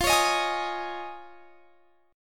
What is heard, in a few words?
F Minor 7th Sharp 5th; strummed